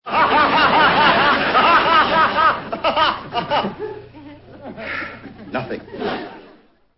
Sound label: laugh; Dr. Bombay